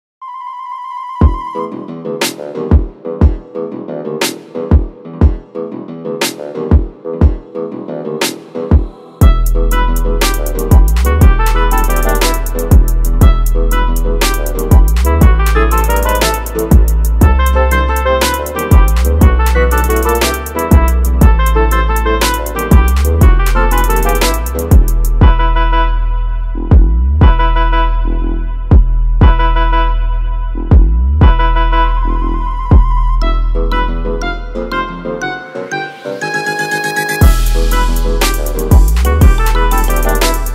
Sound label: bass